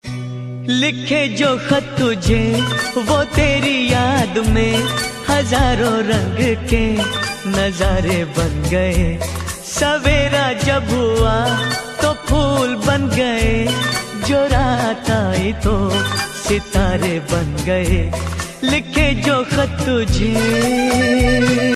hindi instrumental ringtone

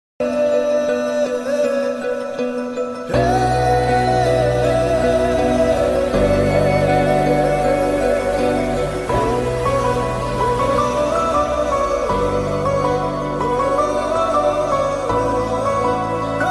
drama ringtone; jhoom drama

